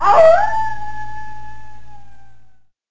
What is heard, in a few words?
Howling Sounds